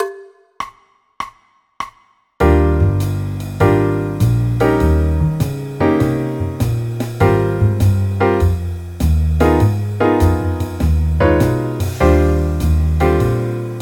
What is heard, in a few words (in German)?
eb dur akkordverbindungen